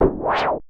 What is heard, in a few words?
909 Sweep